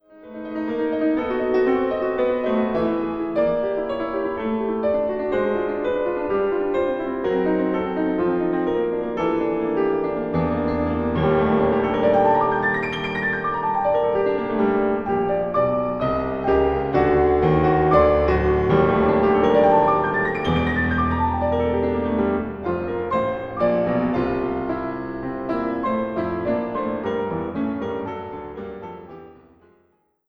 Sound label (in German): Fuge für Klavier in g moll; Deduktion und Fuge für Klavier in g moll; pianostukken van Wilhelm Berger